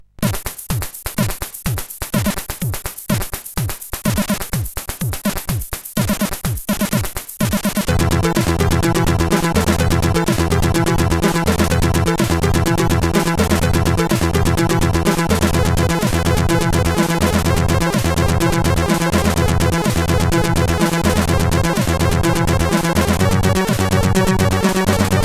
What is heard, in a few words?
Short Techno sid CSG8580